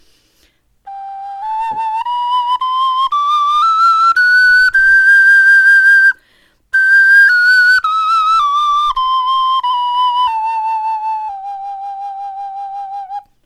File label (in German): tonleiter